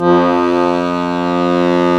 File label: Bass Accord; Club-50 - Foundations Roland; Accordians